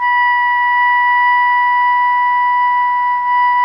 SOP PP B 4, Saxophones; Greytsounds; Philip Wolfe David Crozier's Saxophones

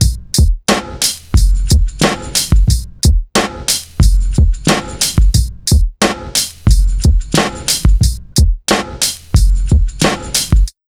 BEAT, Underground Hip Hop; USB Soundscan vol.02; AKAI

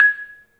MARIMBA; 3D Sound; 3D Keys VOL-1

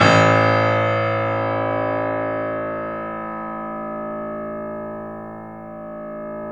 The Piano A; InVision Interactive - The Piano

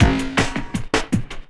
LOOP, 160 BPM, Drum & Bass

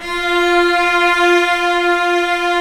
STR SEC. -R

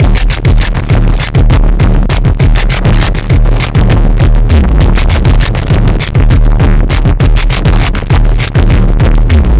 Fuzz Beat, Extra Tweak Grooves

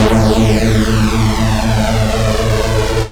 saw lead synth

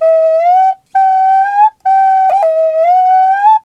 didgeridoo riffs; Big Fish Audio - Didgeridoo and Other Primitive Instruments; FLU RIFFS 1

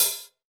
FOOT HH; EdgeSounds; Drum Mashines VOL-1; MWAVE DRUMS

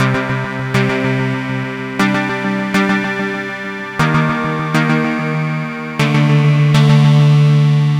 Stabs, Synth Textures

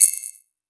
vermona drm1 mk3 hh

